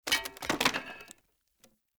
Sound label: Barrel Wood Hollow Medium Destroy Chunk; Objects